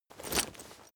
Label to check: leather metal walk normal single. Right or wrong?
Right